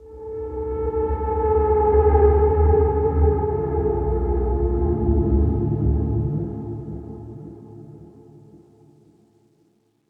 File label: tragic Ending